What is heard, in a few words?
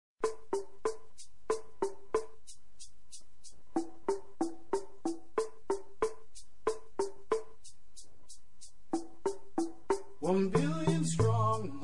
Muslim Rap